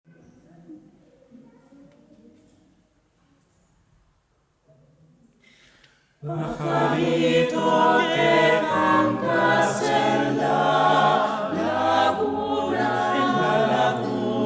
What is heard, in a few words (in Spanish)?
Coro Vocal; villancicos